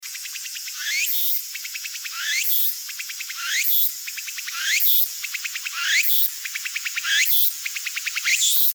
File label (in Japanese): ツクツクボウシ ループ